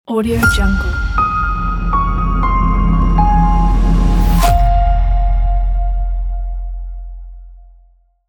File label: tension piano logo